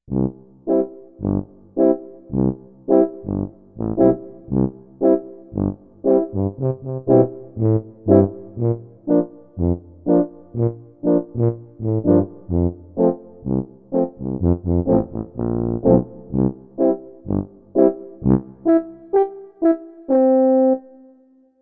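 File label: French Horn Tuba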